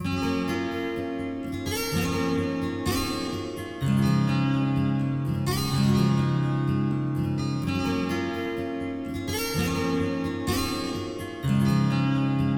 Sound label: chilling funk, 432 Hz